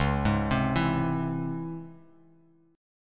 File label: Db Minor Major 7th, down, Bass Standard; DbmM7 arpeggiated; JGuitar